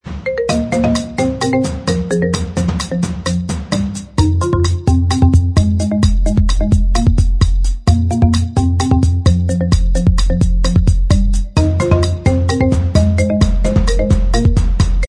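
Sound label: instrumental, backing track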